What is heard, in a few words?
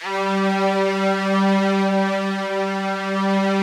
space strings G3; Yamaha VP-1; Music Store